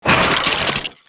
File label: Brute Spinjitsu; creatures organic; Skullken